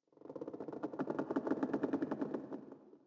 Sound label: sting tree creak; Rust